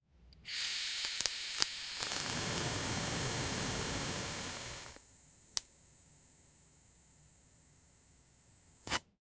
objects

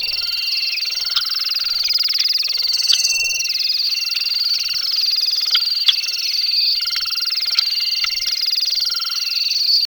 isolated wildlif; wildlife

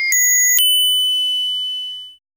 chiptune coin collect sound

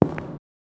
footstep walking concrete

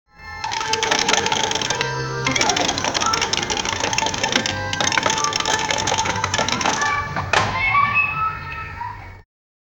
two kids playing with an, bedroom

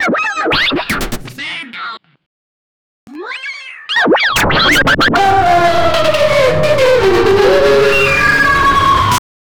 begin with the crackle of, shortwave

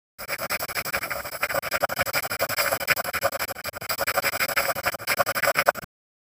asmr sound effect of a, pencil

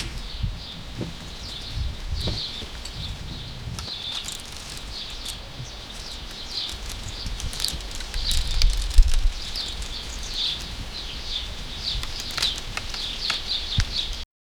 soft rustling of leaves t; village

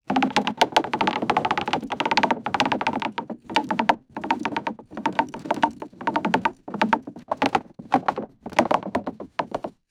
a wooden horse shaped cyc, toy

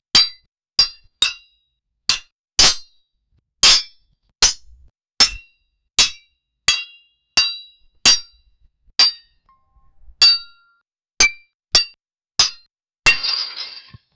the sound of battle sword, clashing swords

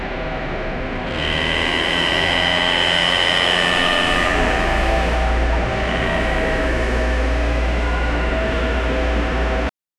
background score for a; architecture

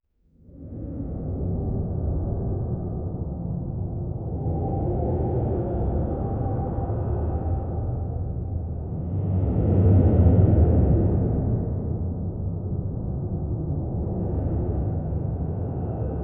medieval dungeon interior

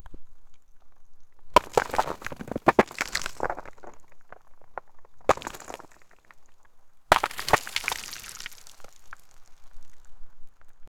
a hard rock hits rocks; impact

